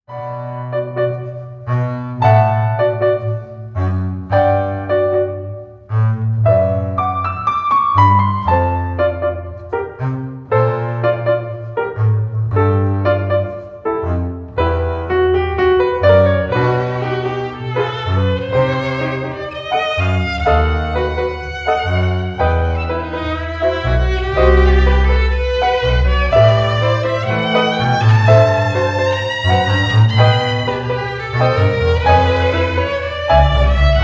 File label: REGGAE FLAVOUR